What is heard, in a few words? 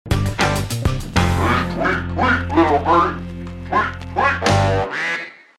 tweet tweet